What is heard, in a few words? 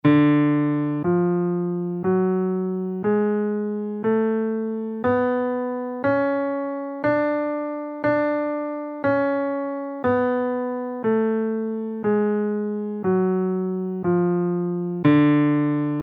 lydian 2 scale in D